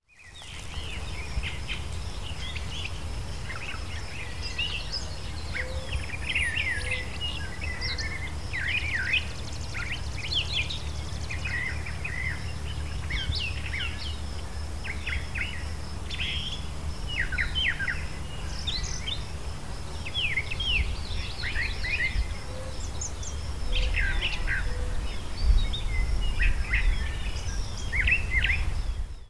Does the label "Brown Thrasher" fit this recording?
Yes